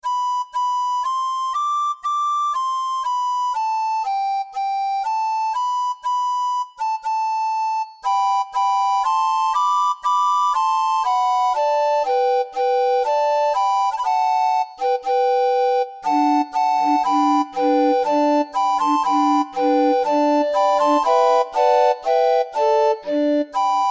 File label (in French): Ode to joy, orgue barbarie